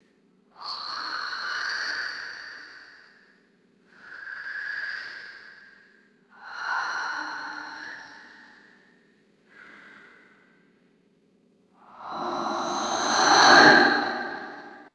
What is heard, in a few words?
whispery ethereal spirit screams whooshing; creatures